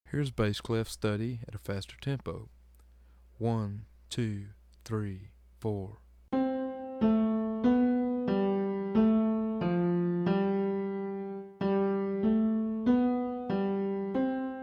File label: Bass Clef Study Fast